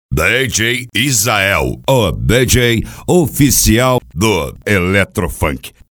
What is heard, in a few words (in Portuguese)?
vinheta, Eletro Funk